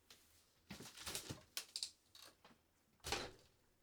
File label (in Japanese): 冷蔵庫を開ける